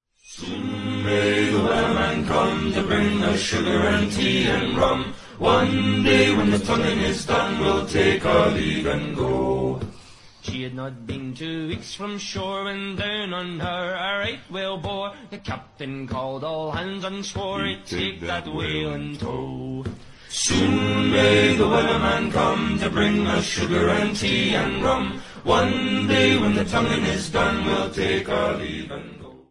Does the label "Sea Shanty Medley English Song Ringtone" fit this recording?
Yes